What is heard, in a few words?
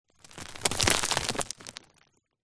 mud dried clumps small fall roll hill break crumble landslide; zapsplat; foley